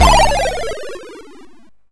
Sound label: YM FX Spiral; SFX; CHIPSHOP DELUXE; CHIPSHOP HITS